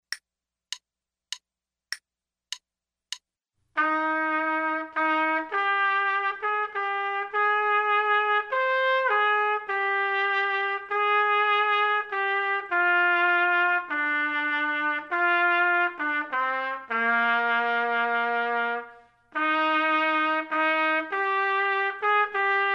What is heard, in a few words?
trumpet 2, Time Study; metronome setting for this performance is dotted quarter note = 76; Selected Duets for Trumpet, Page 21 Number 18, Time Study, performed by David Summer.; Trumpet 2 Only